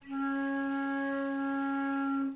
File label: D4, baroque flute